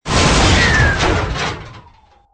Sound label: death, robot; mob